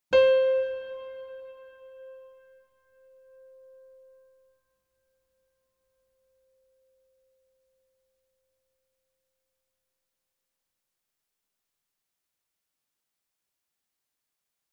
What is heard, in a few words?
C5 E5 A5 Grand Piano & Effects; Sound Sampling Collection VOL-3; Grand Piano 88